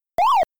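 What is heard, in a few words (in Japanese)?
monster movement sound, during spurt; super pac-man; arcade; モンスター移動音(スパート時1)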